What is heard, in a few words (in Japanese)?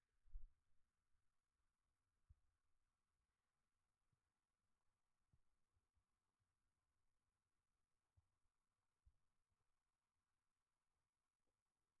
軽く耳塞ぎ R; KU100